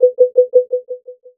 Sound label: level, sonar; staircase